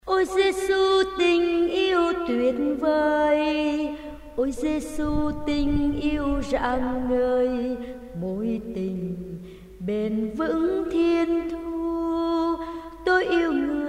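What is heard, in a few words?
soprano alto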